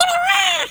skelly small; Garry's Mod; tf2; halloween; skeletons

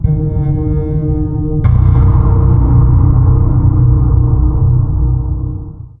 mysterious perc, halloween ambient; Garry's Mod; tf2

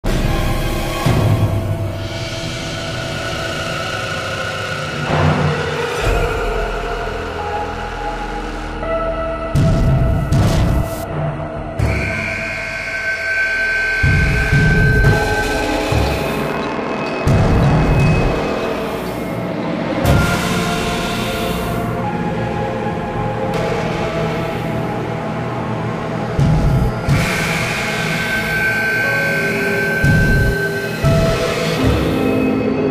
panic room loop; music; catnap; nightmare criters

